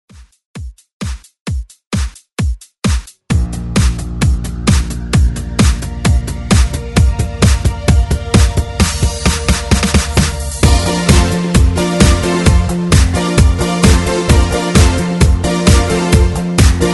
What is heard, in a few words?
20s ReDrum 131Bpm; DJDOLLS